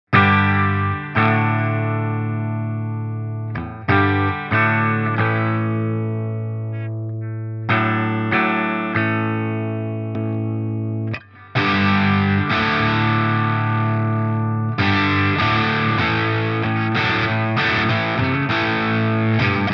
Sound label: Z.Vex Sound of 59 Vertical Drive 12 h Tone 12 h Boost off on